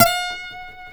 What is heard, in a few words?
G 5, Guitars; MARTIN 6; Spectrasonics; Hans Zimmer Guitars VOL-1